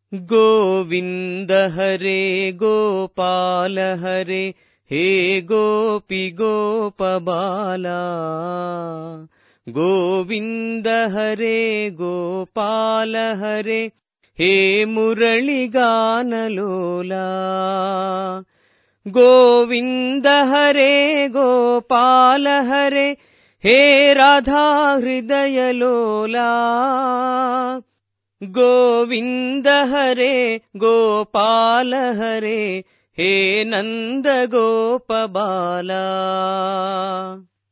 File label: GOVINDA HARE TUNE